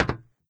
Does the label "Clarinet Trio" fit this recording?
No